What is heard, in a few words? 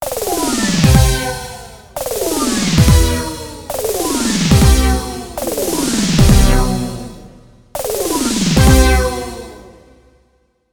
House Hit, Korg Radias-R